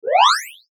Mouseover Sweeper Short

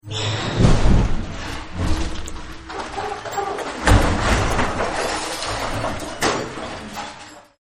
Take It Apart, Smashes; Objects; Partners In Rhyme

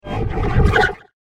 Alien Space Machinery

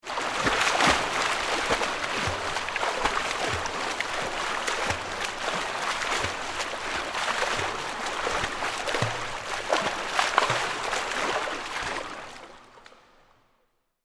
Pool Indoor Swim, Water, Splash; Pools

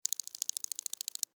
Insect Run Wet, Insect, Run, Wet, Slimy, Bug; Animals:Insects; Insect Movement; Blastwave

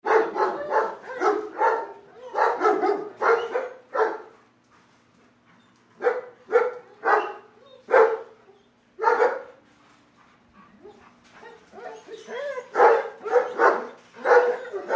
Dogs German Shepherd Kennel Barking; Excited; Animals

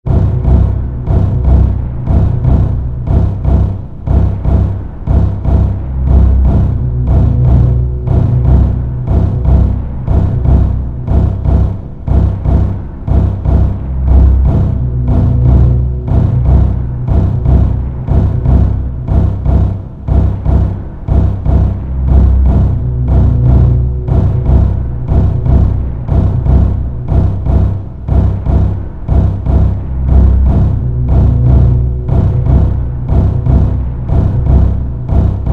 Drone, Heart, Beat, Rhythm, Synth, Horror; 5.1

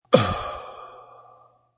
Big Male Exhale, Huge exhale of smoke.; Smoking Sound Effects; Human

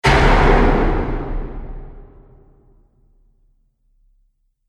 Epic Door Slam

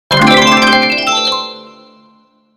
Logo Musical Accent Sweeper; Sonic Logo; Blastwave

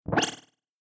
Wet Glass Computer Beeps Interface Delayed Space; Sound Design Future Telemetry; Digital; Tom Hutchings

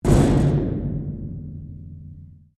Strike Metal Hollow Tank Rattle; Materials

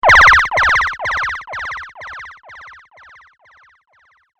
Rapido Laser Echo Attack; Laser Gun Sound Effects; science fiction sound effect

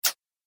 Droid Click, Robot Sound Effects

